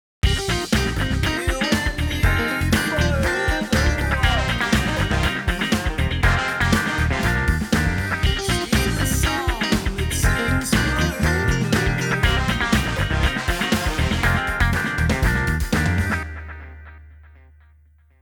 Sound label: MIX TECHNIQUE DELAY SONG DELAY ON